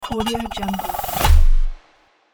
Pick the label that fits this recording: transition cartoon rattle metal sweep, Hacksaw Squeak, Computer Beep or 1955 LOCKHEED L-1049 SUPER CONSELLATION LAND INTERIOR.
transition cartoon rattle metal sweep